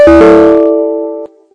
windows 10 bass boosted error

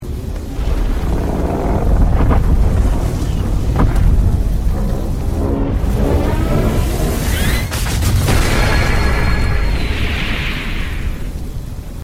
thermonuclear pulse

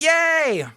yay

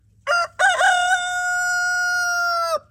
rooster noise

